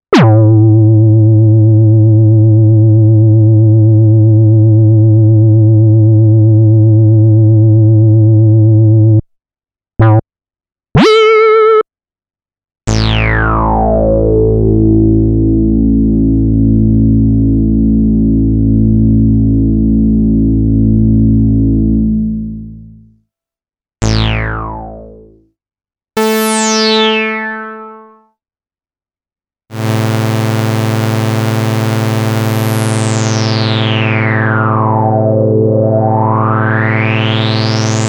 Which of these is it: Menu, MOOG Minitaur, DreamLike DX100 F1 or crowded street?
MOOG Minitaur